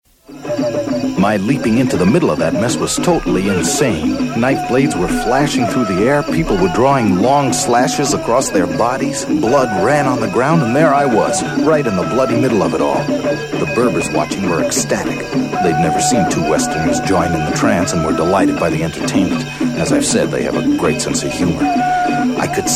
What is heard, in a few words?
Promo Trance music